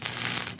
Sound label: Stun Baton